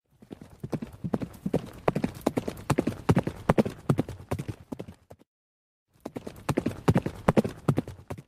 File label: horse galloping animation